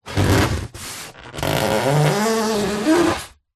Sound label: foley polystyrene hand rubs creaks; zapsplat